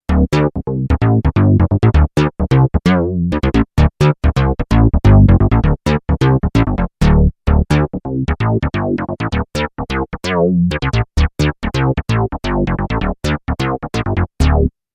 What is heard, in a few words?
MKS-80 Single Hard Unisono Bass